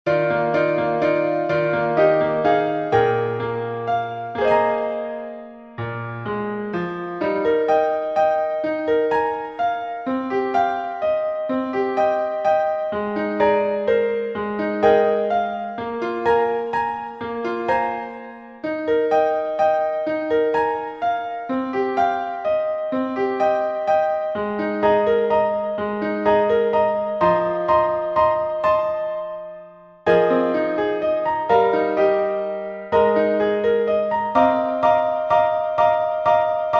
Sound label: piano accompaniment; audition